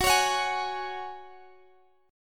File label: Gb Suspended 2nd, Guitar, Open G; x,x,16,18,19,18; down; chord shape